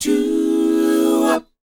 DOWOP F#, Vocal R&B DOO WOP; ILIO; Vocal Planet

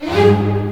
HIT, synths; Rubber Chicken Software Sample Bank

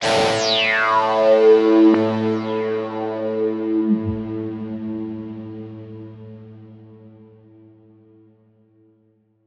Guitar Notes A; Zero-G - Future Wave

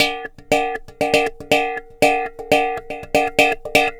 GONG, THE BENDS120; ILIO - Ethno Techno

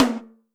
tom, Zoom MRT-3B

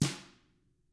TAMA BR MF, Drums; ILIO; Double Platinum Drums 1

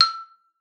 E5, xylophone; Xsample VOL-4

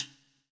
POWER RIM

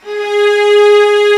Vla G#3; Digital Sound Factory Virtuoso

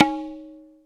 PER Percussion; Mix-Percuss

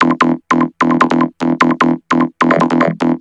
Vocobeat; Sonic Foundry (Sony Creative Software)

